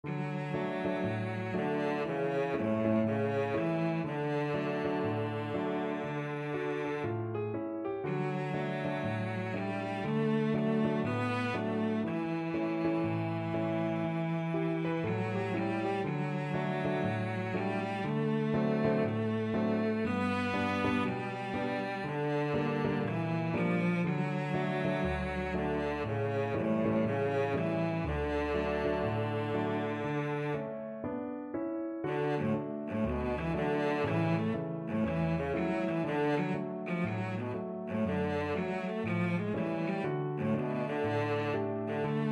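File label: violoncello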